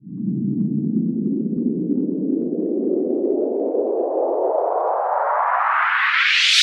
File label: Sweeps Up, Effects